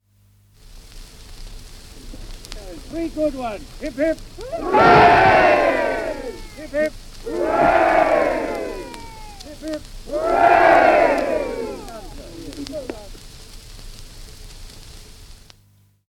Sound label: Small Cr, Small crowd shouting ''Hip hip horray''; from 78 disc; BBC